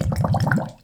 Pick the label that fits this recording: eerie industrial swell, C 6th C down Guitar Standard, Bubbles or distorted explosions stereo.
Bubbles